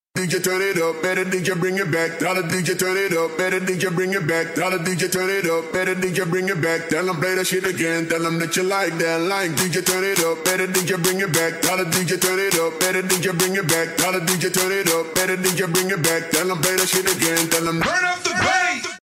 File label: Bring The Bass